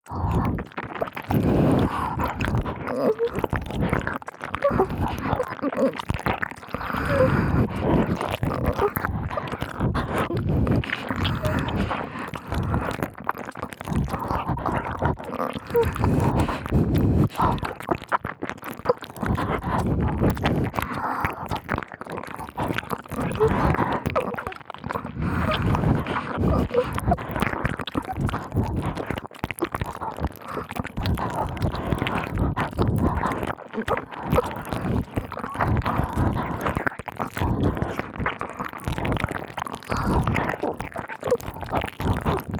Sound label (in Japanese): (両耳) 激しい 耳舐め; KU100; くちゅ系効果音版; 舐め音比べ